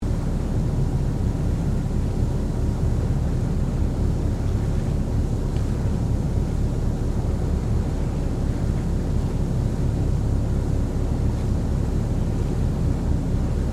Boat Ferry Freshwater Class Sydney General Ambience Stereo; Manly Ferry; Boats; Vehicles